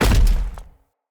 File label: Step Default, Footsteps; Enemy; Nimrod